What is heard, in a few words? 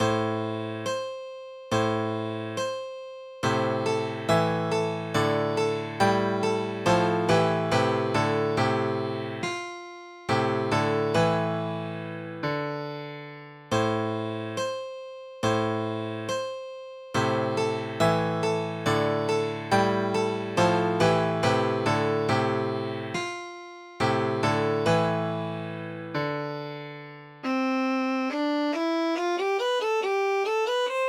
funeral march